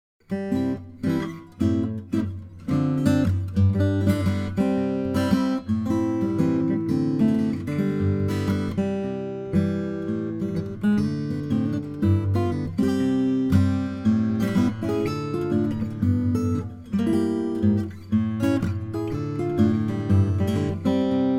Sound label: swing, fingerstyle